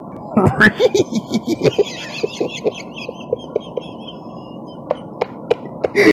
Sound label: hahhah